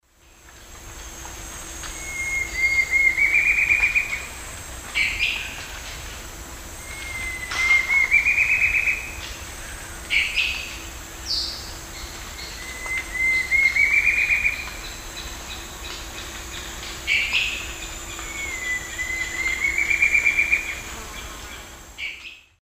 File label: Campylorhamphus procurvoides, song; S. America; Eastern Lowlands